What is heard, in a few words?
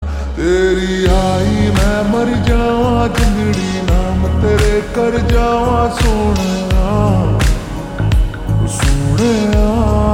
Ringtone Slowed Reverb